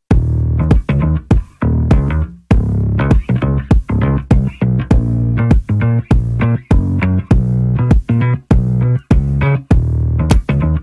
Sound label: Attention bassline